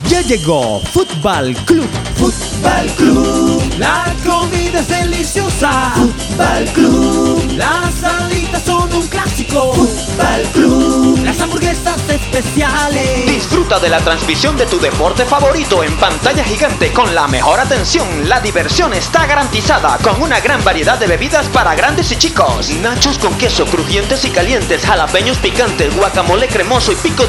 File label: Futball Club Jingle